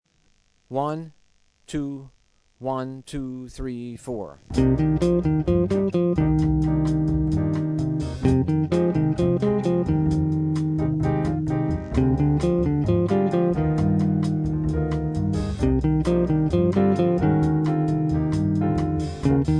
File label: Asc; Penta Patterns